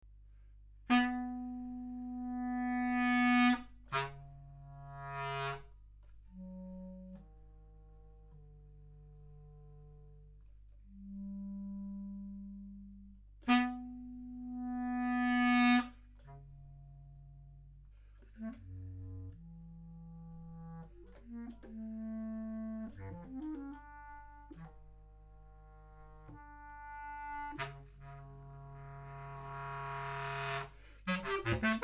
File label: clarinet